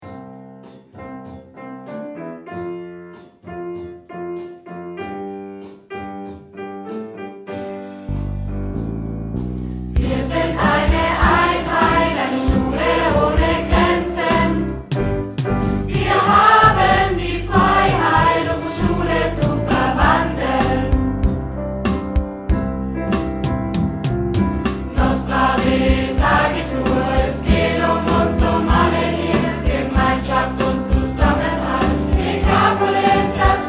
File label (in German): hymne